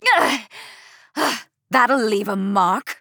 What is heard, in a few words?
Taking Damage; Tyra; Paladins